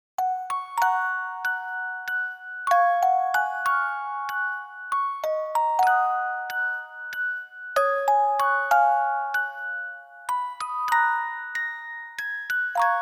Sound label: orgel ver.; vocal CD